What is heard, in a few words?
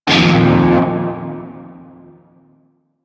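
Shawzin Pentatonic Minor Chord E; Music; Warframe; Narmer Shawzin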